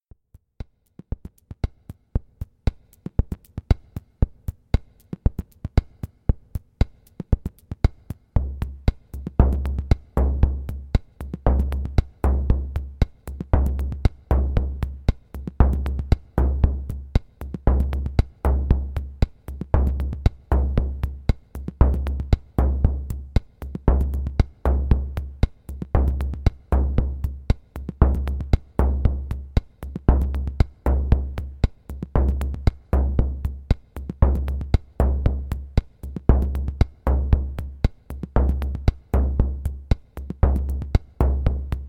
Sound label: Volca and moog